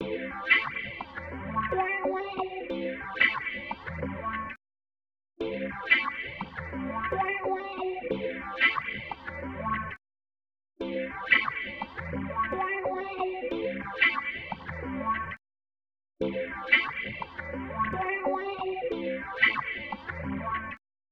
Guit; TOB STUDIO

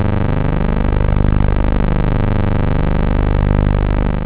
analog, synth

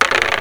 roll done

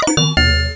Bloing; bars & pipes sound kit 1; Instruments

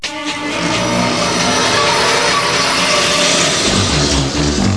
Music Start; 300 games for mac; Arcade; Apeiron

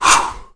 breath

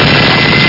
small minigun; NEMESES

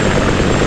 jet heli

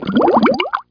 bubbles; outlaws

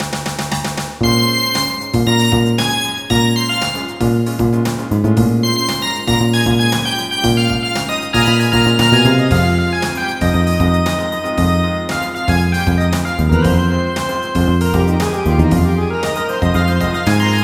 big solo

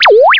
beduwee; Drop-Check 1.2.3

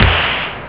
noise

